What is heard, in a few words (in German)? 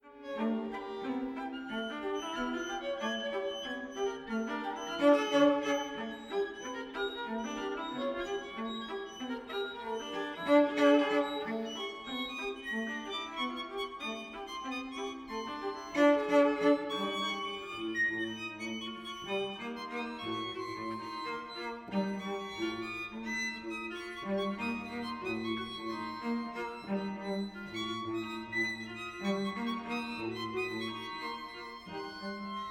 Streichquartett; Cerha; 2. Streichquartett